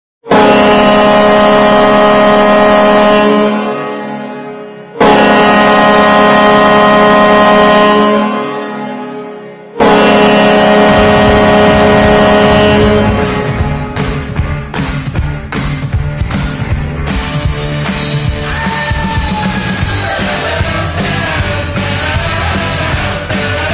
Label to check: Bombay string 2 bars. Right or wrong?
Wrong